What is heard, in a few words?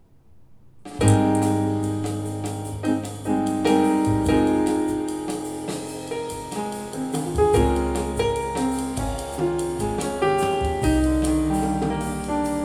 jazz